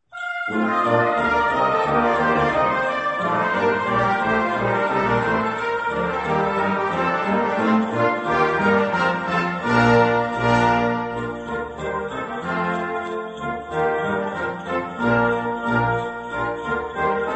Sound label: Christmas Carols